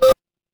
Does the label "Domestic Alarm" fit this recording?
No